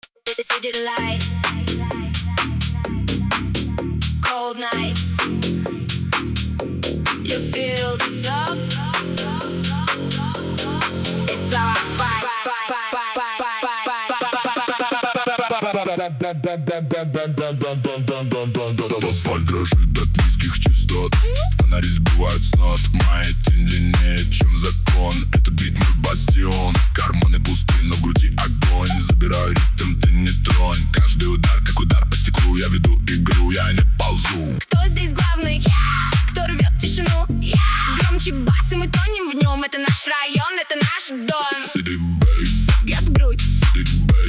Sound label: city bass; charka